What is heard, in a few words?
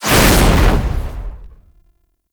Demonium Summon; Warframe; Uriel